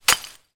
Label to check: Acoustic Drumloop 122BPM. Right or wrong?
Wrong